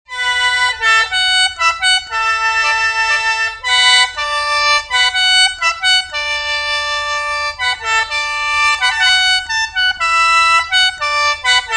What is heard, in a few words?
sheng